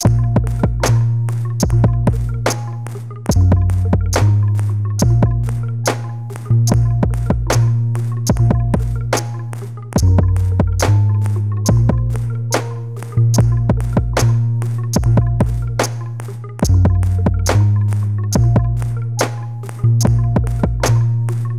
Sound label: Beats Experimental; Genx Beats